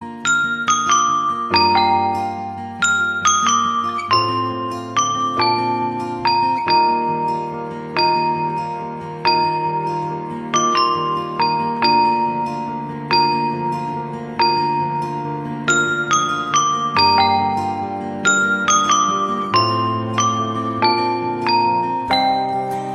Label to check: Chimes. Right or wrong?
Right